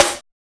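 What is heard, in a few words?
VIVID SNARE; Drums In Your FACE!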